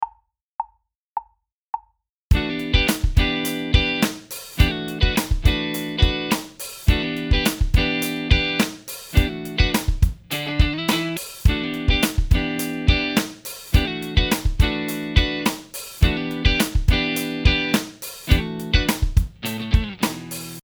Minor 7ths

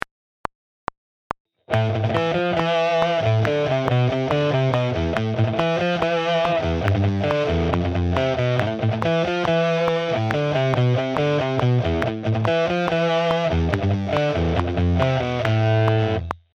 Metal Rhythm Guitar

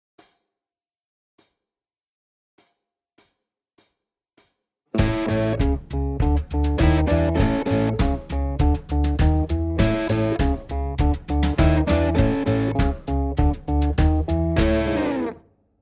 Chicago Blues Guitar; Chicago Blues Guitar Lesson

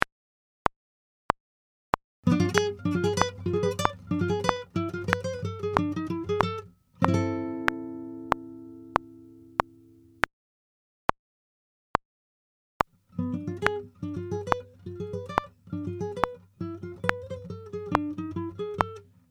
Sweeping Ex

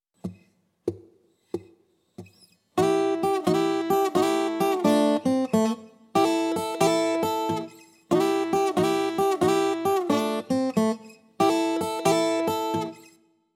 Open Tunings For Blues Rock Guitar

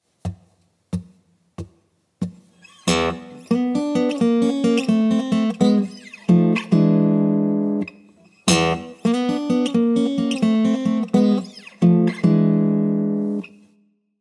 Blues Guitar Turnaround

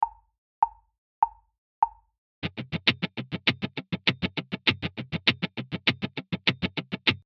Funk Accenting 16th Mutes

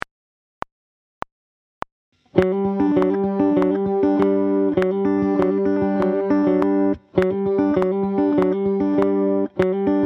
Hybrid Picking

